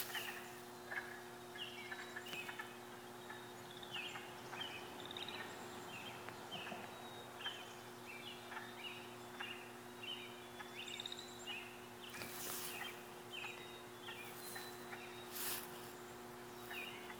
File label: woodfrogs